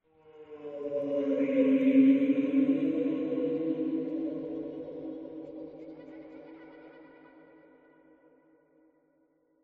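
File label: random laughs; xen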